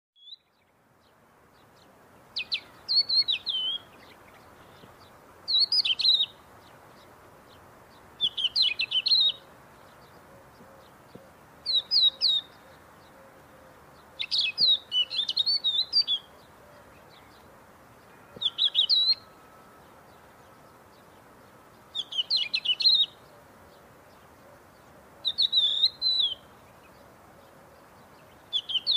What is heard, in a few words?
Houbara